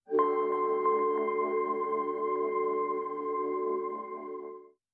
Mbira, Sting

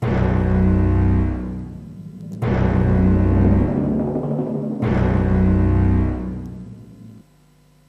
Drumwar

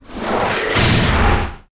Big Heavy Flutter, Taylor Grand

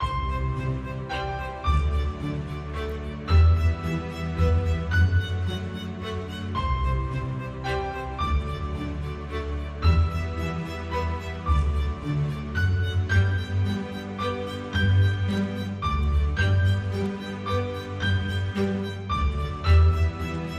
Underscore

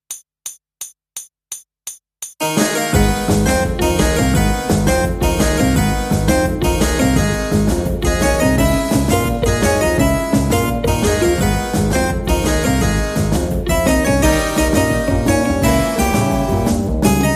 Harpsichord

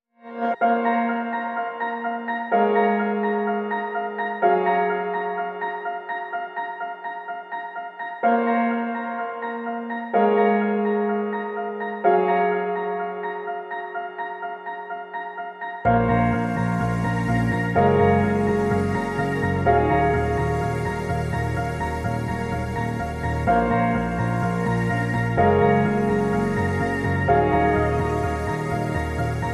No Drums Mix

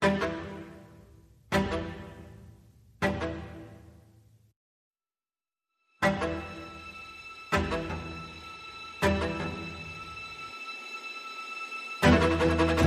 Light Percussion

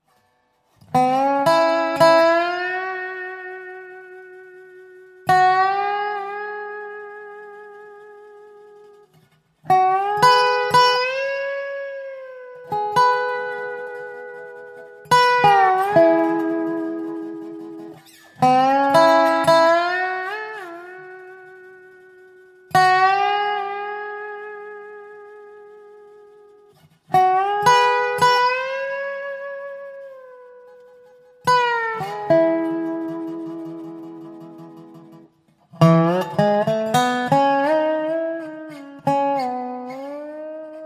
Melody Instruments Only

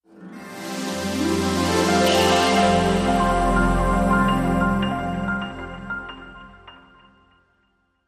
Light n Smooth Ident

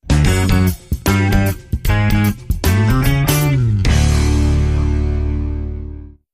Funk

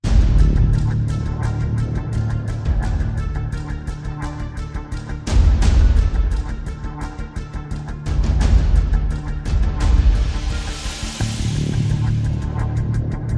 Perc and Pulses Only

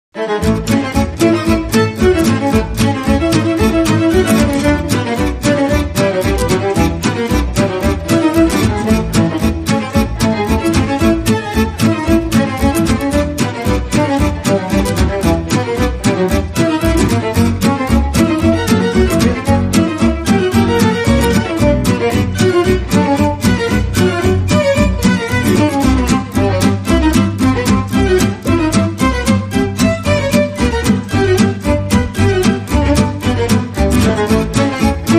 No Percussion

